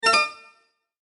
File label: multimedia rollover, Multimedia Rollover Tone; Sound Design; McKinney Sound